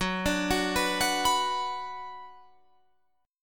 F sharp Suspended 4th Sharp 5th F sharp Guitar Drop D